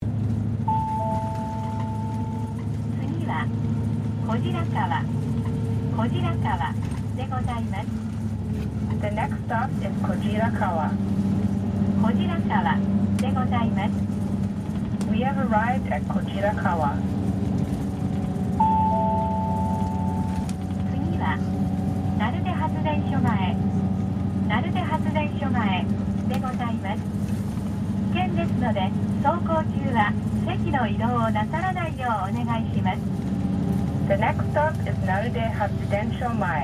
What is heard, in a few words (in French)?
Annonces Bus, Ainokura